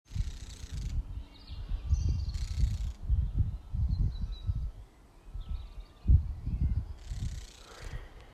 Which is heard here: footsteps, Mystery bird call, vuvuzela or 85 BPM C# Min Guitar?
Mystery bird call